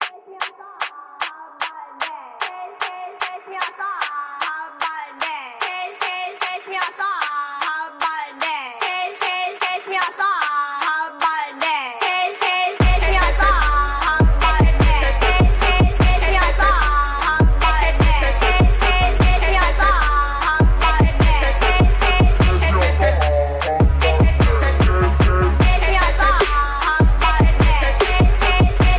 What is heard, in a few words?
Beat Pause